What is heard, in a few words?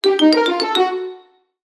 cartoon ascend climb sneaky orchestral